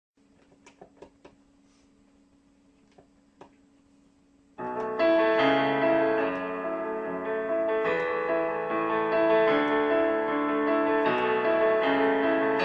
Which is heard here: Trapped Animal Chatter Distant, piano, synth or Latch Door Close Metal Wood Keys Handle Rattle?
piano